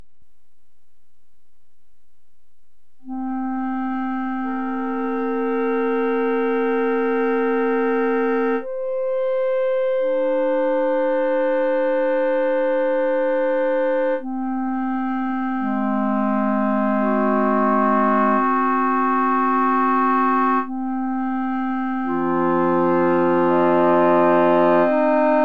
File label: 7th harmonic intervals